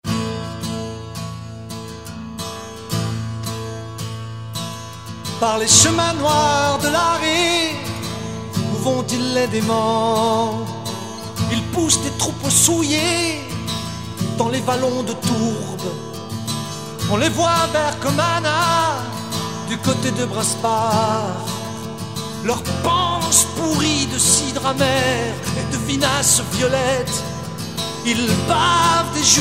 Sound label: acoustic